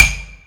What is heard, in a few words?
somen catch; HeavenStudio; rhythm Somen